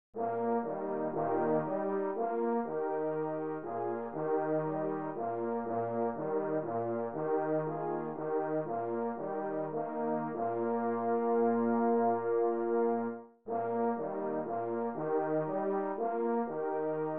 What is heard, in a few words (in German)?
polka; Eb